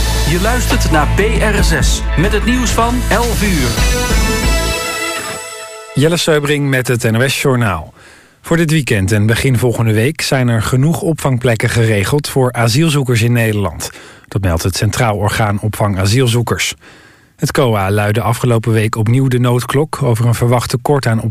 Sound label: Jazz